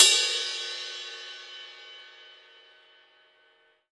Huge Drum Ride G# Key, ride cymbal tuned to the G# note. Loudest frequency: 5565Hz